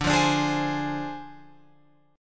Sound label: D Minor 7th Flat 5th Guitar Open F; Dm7b5; strummed